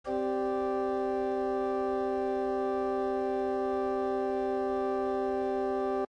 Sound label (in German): C quintenrein